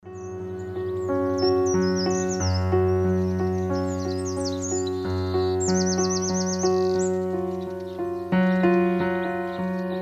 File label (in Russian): utro pianino